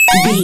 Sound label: retro collect pickup item